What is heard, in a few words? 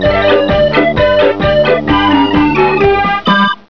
clown march; Galloping Graphs; animal graph